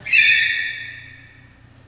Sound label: Car Tire Screech